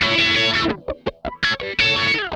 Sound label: RASP, Funk Guitar; 90 s Sample CDs; Zero G